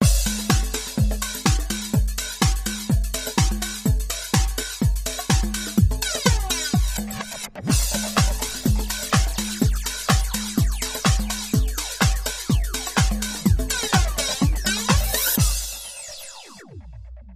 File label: Drums & FX